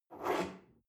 espresso grab from table slide medium, restaurant cafe